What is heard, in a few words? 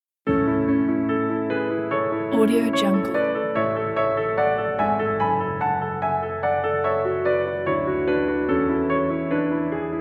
piano; mendelssohn piano op 19 no 4